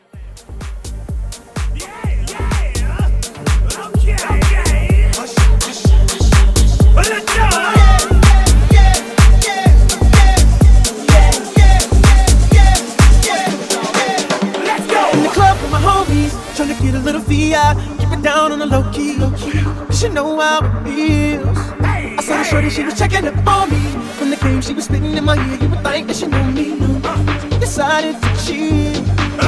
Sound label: Mashup 126